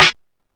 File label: snare